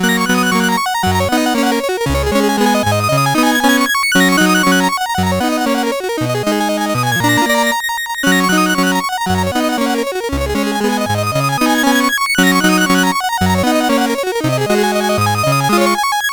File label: Etude on the Black Keys, CSG8580R5